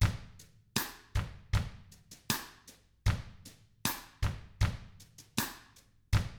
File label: COUNTRY, Drums; ILIO; Double Platinum Drums 1